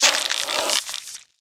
radscorpion aware; Npc radscorpion aware

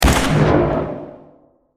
Rifle Laser Shot; FoS; Institute

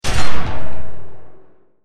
Shot Gun Combat; FoS weapon sounds

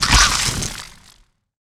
antqueen attack spit

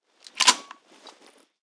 Wpn shotguncombat jam, shotgun combat jam

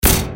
Mini Gun Shot; FoS weapon sounds

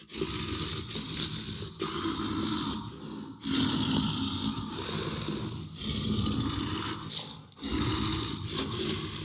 sporecarrier conscious

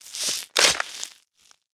ant attack

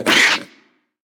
mantis chase vox

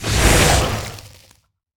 sporeplant fire

